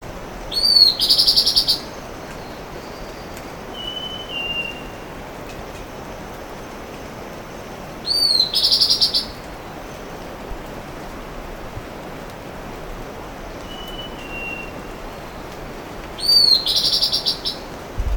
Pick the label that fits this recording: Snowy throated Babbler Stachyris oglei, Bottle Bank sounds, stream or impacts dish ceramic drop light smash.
Snowy throated Babbler Stachyris oglei